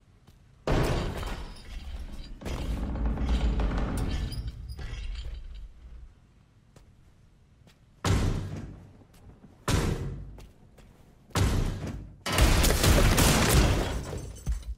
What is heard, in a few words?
breaking down door